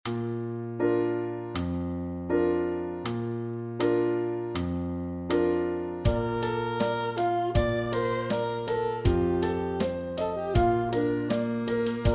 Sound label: Sax Study